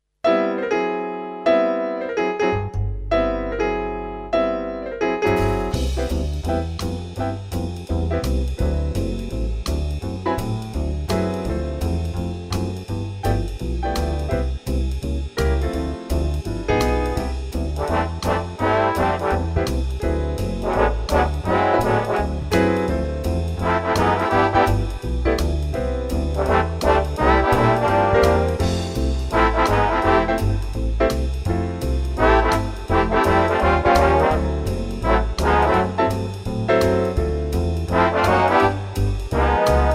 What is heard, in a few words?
brass minus